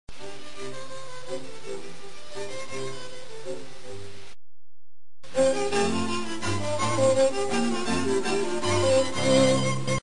suave enfatica cadencias; musica classica

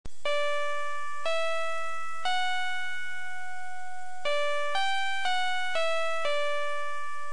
a monofonia; musica classica

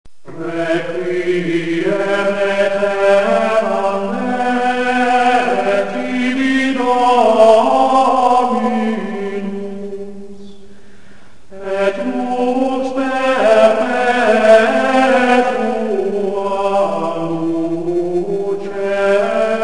canto gregoriano; musica classica

